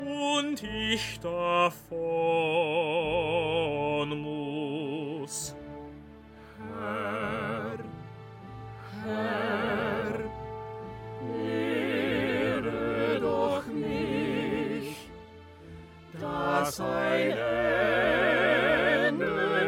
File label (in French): Tutti; A-mesures 014 à 034; ein deutsches requiem de brahms part iii